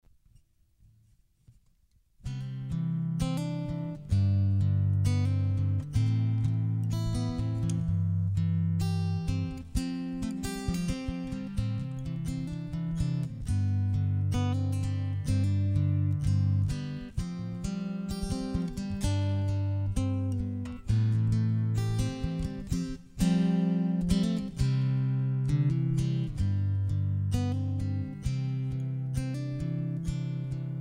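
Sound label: Classical Guitar Instrumental; Acoustic Guitar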